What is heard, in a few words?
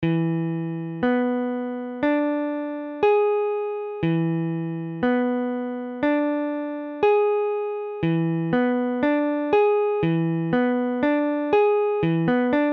E7; guitare